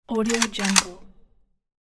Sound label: ak47 reload; saqo 92